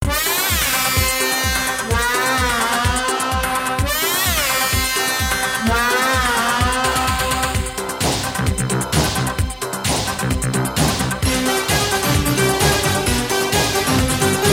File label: Classic Rock